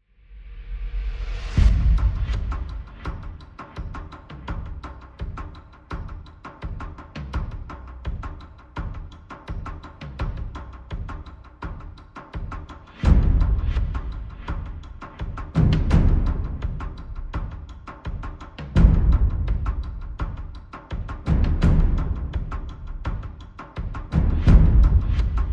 Percussion Only

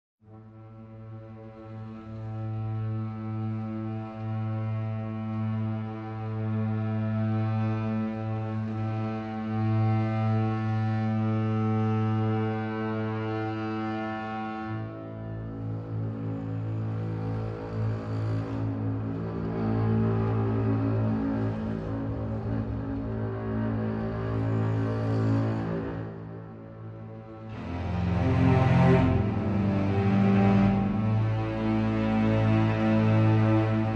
Low Strings Only

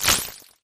Fast Thin Grit Sweep

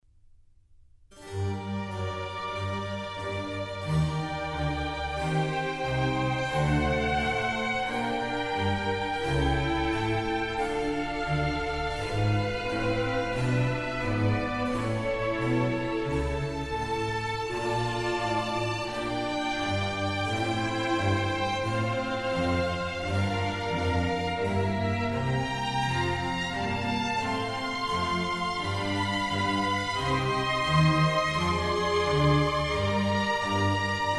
Concerto for Strings in G minor; RV156; Antonio Vivaldi

